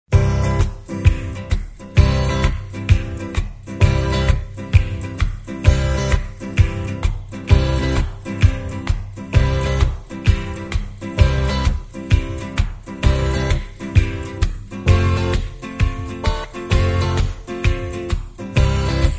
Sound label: harmony